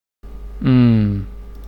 Humm (young person)

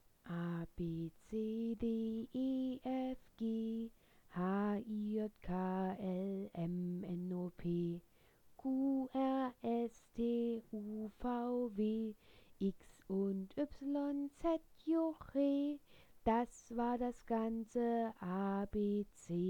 ABC Song

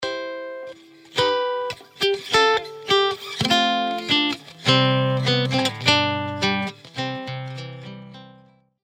wedding melody

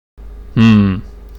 Humm (old person)

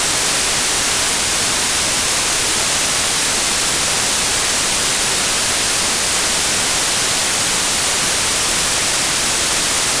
Gaussian white noise

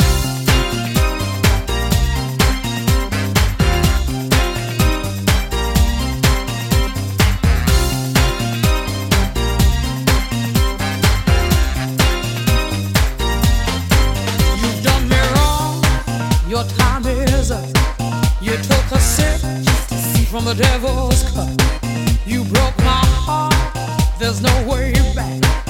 House Music Samples

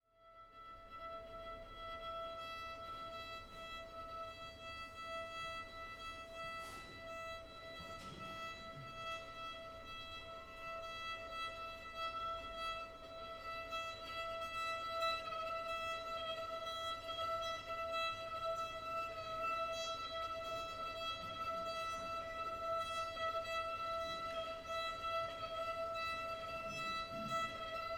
Style electronic chamber entity

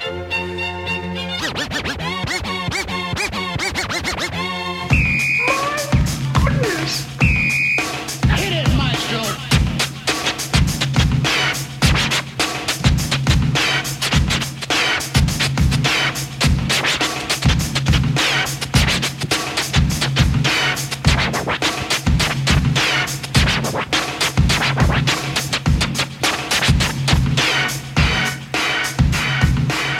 Industrial hip-hop Sample

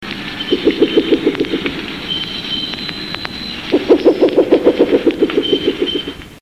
Pulsatrix perspicillata

